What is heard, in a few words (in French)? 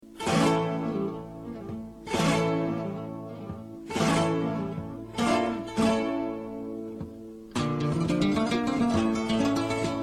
tradi flamenco; postromantisme